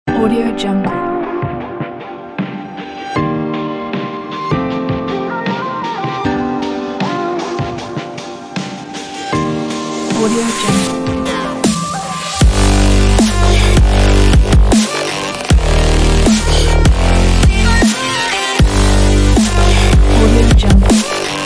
business electronic; voobeats

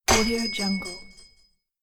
glass ball split

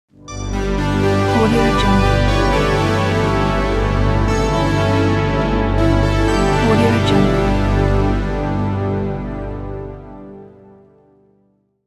80s vhs logo; hypertunes

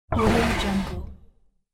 wooden slide; fxprosound

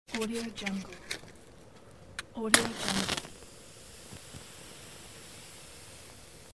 match

